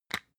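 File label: floss open compartment, Bathroom; haus-garten; badezimmer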